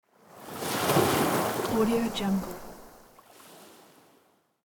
stormy sea wave

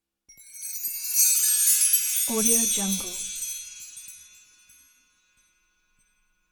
magic chimes

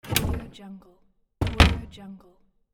cabinet door open close

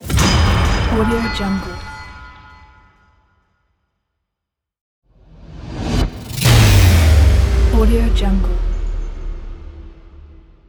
trailer power sound fx; akkord system

